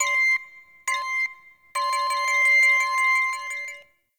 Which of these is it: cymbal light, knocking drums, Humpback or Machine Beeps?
Machine Beeps